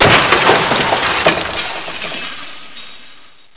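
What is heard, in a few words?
glass break; ParoxysmII; ambience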